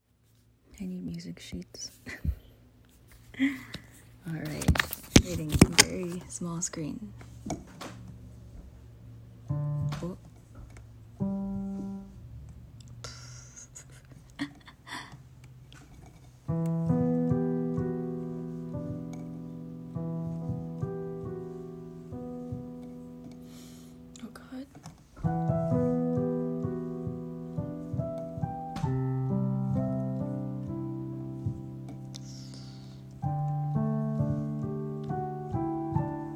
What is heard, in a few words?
piano